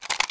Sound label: lmg bolt backward; tf2classic